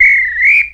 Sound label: Referee Whistle, Sports & Recreation